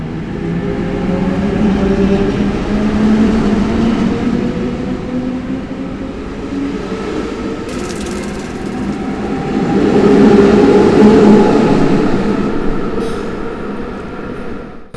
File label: s-bahn